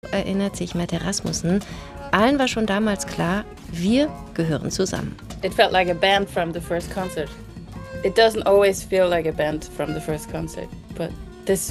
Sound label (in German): Vokalmusik